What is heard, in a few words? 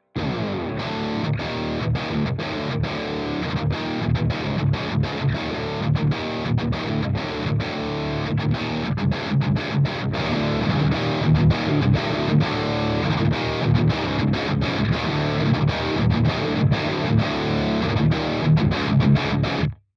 riff